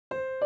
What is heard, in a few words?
Holst 1 S, community choir